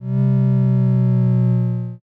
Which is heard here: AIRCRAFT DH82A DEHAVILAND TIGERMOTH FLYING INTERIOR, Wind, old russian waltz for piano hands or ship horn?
ship horn